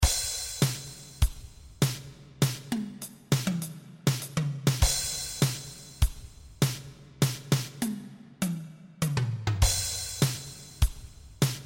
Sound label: drum fills